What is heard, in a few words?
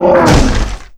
Cart puller death; Kubera Mine